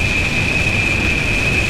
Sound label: Rocket Missile Loop; SFX Kit; Weapons